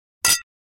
Glass Ding Crystal Champagne Flute Toast Muted, Household